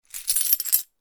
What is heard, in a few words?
coin sound; game inn